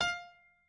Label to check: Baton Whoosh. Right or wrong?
Wrong